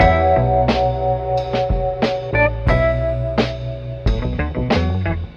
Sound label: guitar, loops; Phonky